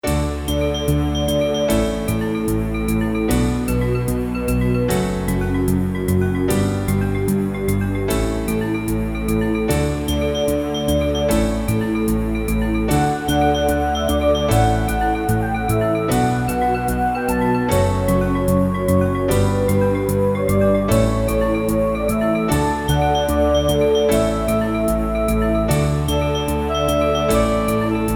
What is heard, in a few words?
Casio GZ-50M; Lemmings Level 1